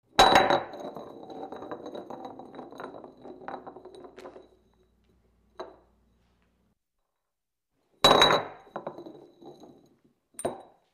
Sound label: Bottle Knocked Over; bottles